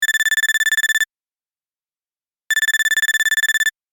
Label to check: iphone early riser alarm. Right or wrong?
Wrong